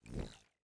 Battery Plate Light-Off; Viewfinder; Xbox Series X/S

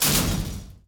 Spikes Snap Up; Col Spikes Snap Up

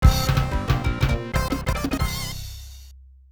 Jackpot; Cameltry; F2 System

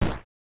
Character Shoot Hit

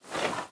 Roll Attack

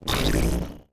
Purple Battery Plate Light On

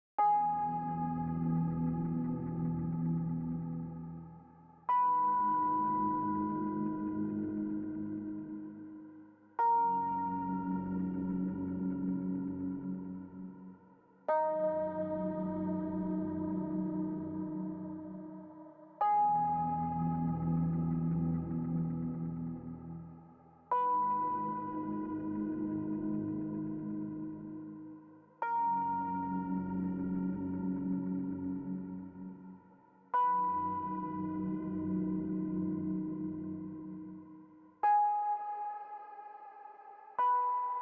Soundtrack Master Audio Synth; starbites

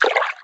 Place Water

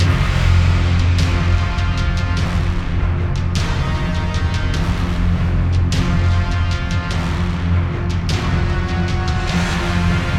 Battle

